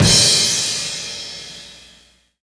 Big Crash, Mixed Percussion; Jungle; Percussion Hits; Zero-G Jungle Warfare 1, 2 & 3; 90 s Sample CDs

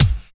TITE KIK; Phat & Phunky; East West

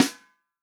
REAL DRUMS FUNK KIT

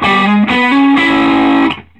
SOLO E, Vintage Blues Guitar; USB Soundscan vol.22; AKAI; SOLO E125

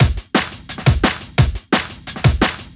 DNB BREAK

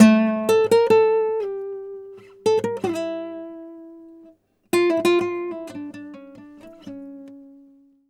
Flamenco, Guitar, a

